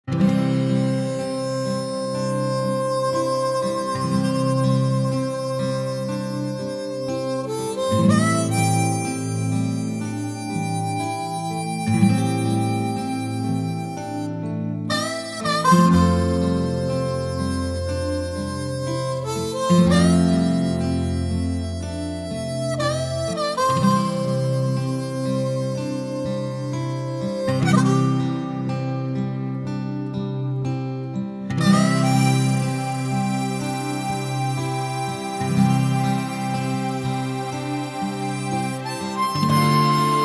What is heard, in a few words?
Harmonica Sunrise